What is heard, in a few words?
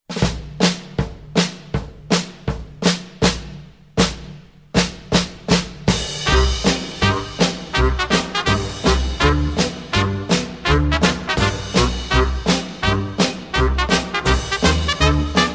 Orchester